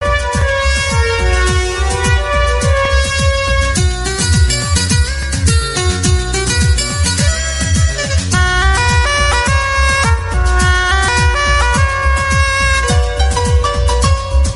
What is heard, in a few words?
instrumental; taarzn the wonder car